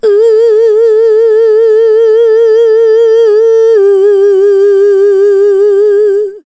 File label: vox, Techno Trance; Ueberschall